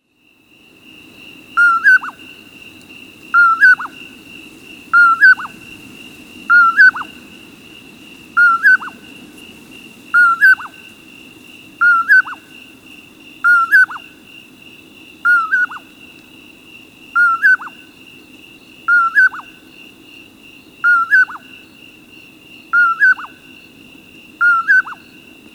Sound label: Common Poorwill